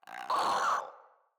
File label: Infested Crawler Hear Sound, enemies; Crawlers